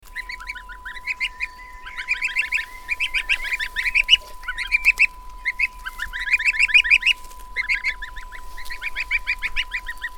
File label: Young Goslings On Grass